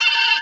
Wizard Mini Laugh

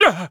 Wizard Pain

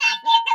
Wizard Mini Reload

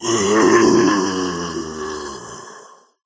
Cowboy Groan

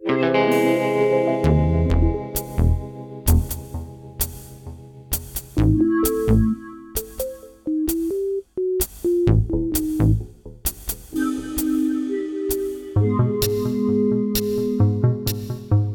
loading

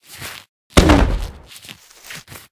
Sling Pea Lob

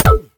peashooter peacannon core mono; cowboy

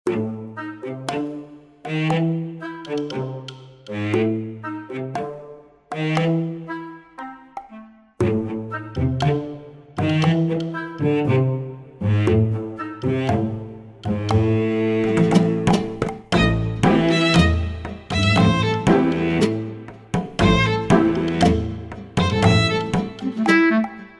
music

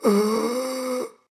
wizard groan

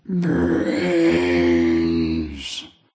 Beach Groan

